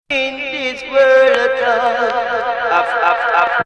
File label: Whirl of dub